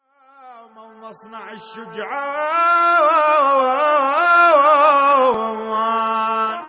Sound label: chant